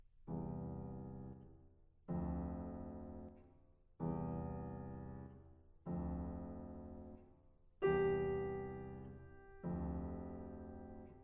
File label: Divertimento For Trumpet, Trombone, & Piano Quarter = 56